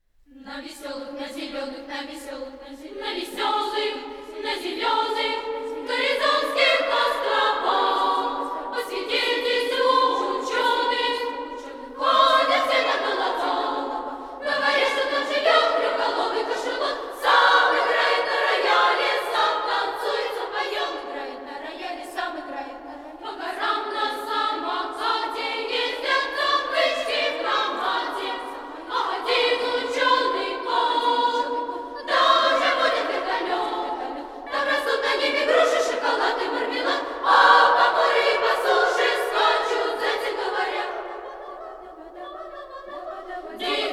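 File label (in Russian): На Горизонтских островах, детский хор; russkaya klassika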